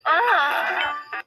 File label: augggh; mop